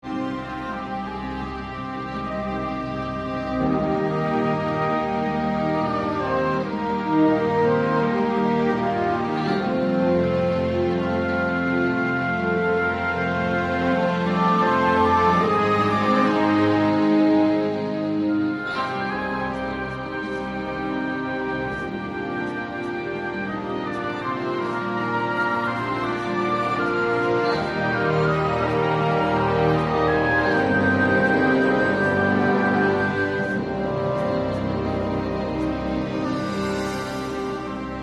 Loop Main Menu Extended Slow Theme Variation; tales from the borderlands gamerip 2015

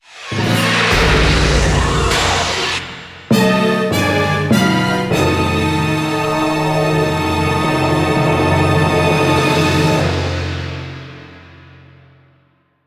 Jingle Huge Appear

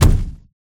chase footsteps; Mommy

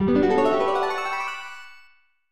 Magical Jingle; Akazukin Cha Cha; SNES

